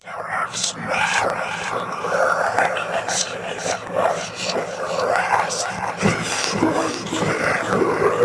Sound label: sound test